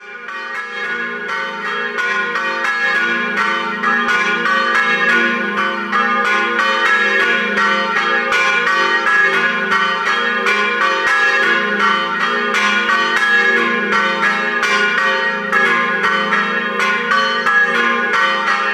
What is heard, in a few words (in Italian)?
Pradis, campane